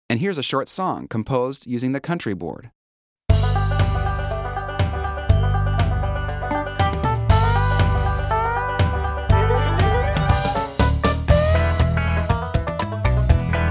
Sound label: country full; xp-80; roland; Country Collection demo song; roland demo cd-3